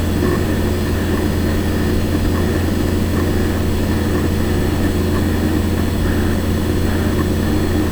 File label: command center, ambient; Garry's Mod; tf2